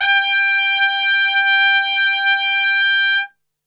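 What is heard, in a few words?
G 3 trumpet straight, brass; Best Service; Brass Super Section; TRP STRGHT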